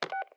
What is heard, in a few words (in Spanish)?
DESCOLGAR TELEF INHALAMBRICO BIP; Yesterday Origins